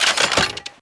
reload; wpn mgl; Titanfall 2

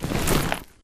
Grunt Mvmt FOL Soft Med; Titanfall 2

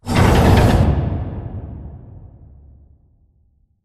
giant metal door close slide; Titanfall 2

